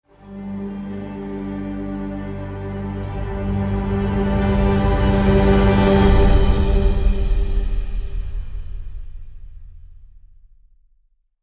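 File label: Light Orchestra Crescendo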